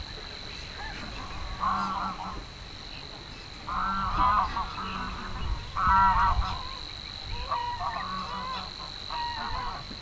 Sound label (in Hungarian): nyari lud sargalabu siraly, halastavak; hortobagyi nemzeti park 2013